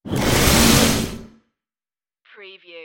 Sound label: rusty starship door